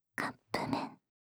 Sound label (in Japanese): カップめん, ASMR; シャッフル睡眠ランダム再生用; 無声音ASMR; シャッフル睡眠法で眠ろう; バイノーラル音声